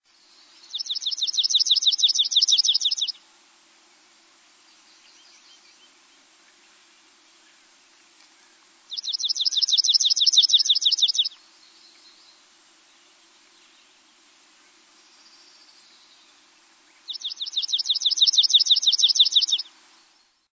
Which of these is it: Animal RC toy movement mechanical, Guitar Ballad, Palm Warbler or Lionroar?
Palm Warbler